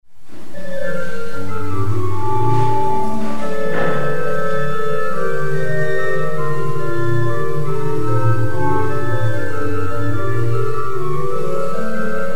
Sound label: courante